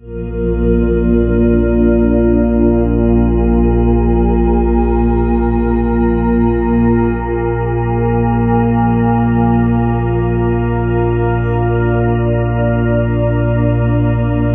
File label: BIG TUBE, ORGAN ST, Ominous Drones; USB Soundscan vol.48; AKAI